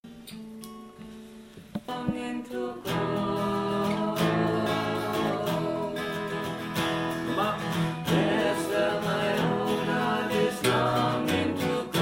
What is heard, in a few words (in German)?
drei Stimmen refrain sowie strophe; carry me back to old virginy